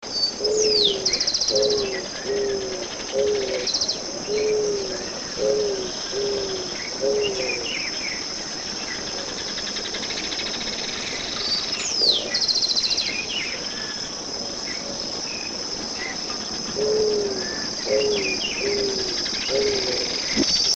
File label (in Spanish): torcaza; cantos